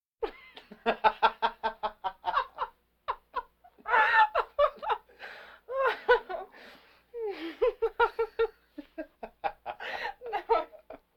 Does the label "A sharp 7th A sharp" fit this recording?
No